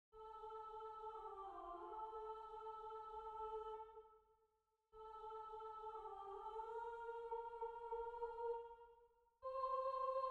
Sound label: Soprano